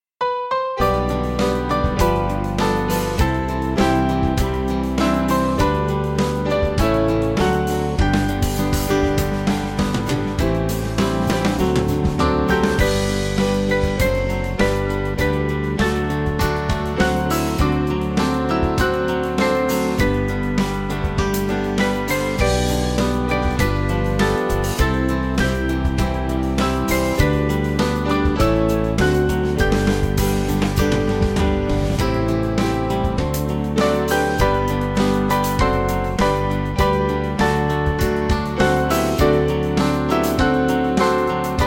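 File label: Music Backing Files for Churches; Cast All Your Care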